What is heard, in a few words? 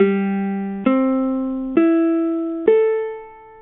TENOR UKULELE GCEA